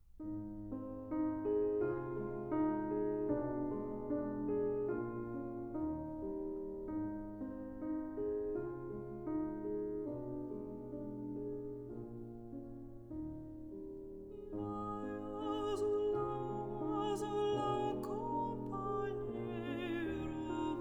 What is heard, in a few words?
for voice & piano (or orchestra); Azulao